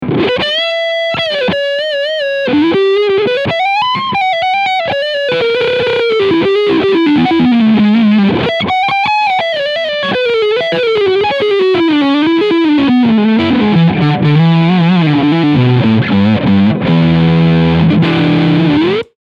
Overdrive Front PU

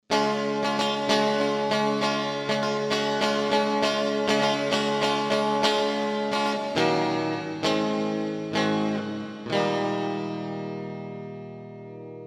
UA FX2 guitar reverb

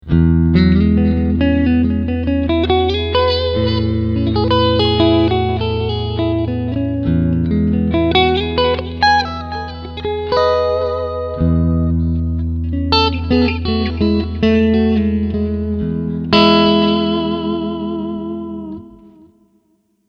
Sansamp Clean

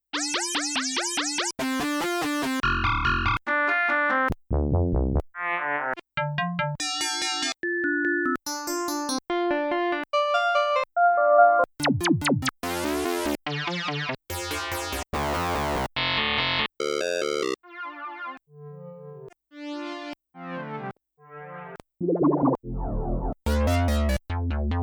Synths

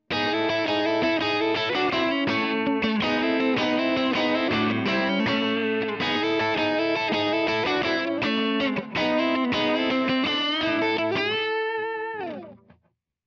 Big Sky Reverb Non Linear

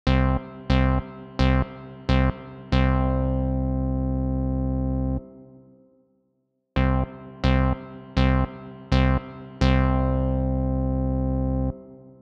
V76 Synth Test Plate Reverb Off On Off On

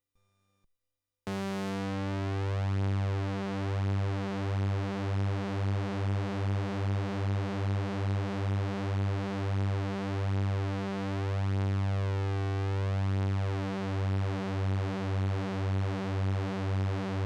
detune; parva

